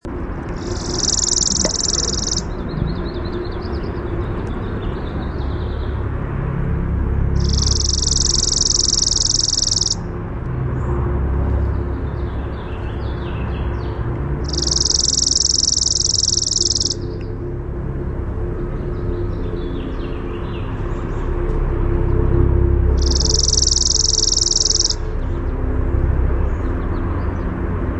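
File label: Chipping Sparrow song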